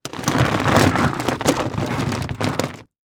Impact Treasure Chest Drag On Rock Salt; Impacts

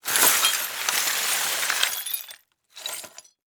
Crash Glass And Wood Debris Searching Through; Crashes

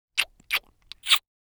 Human Male Kiss On Cheek Multiple

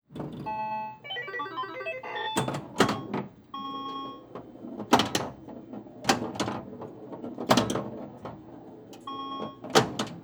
Technology Electronic Pinball Machine Played

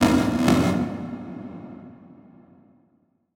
Transition Blast Distortion; Production Element Title Transition

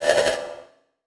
Transition Tremolo

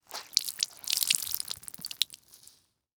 Liquid Water Cloth Wet Rag Ringing Out

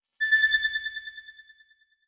Transition Bell Swell; Production Element Title Transition

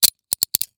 Weapon Handcuffs Shut And Tighten; AdobeCC

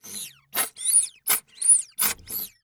Foley Toy Ball Squeaking Above Water

